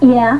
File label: YAH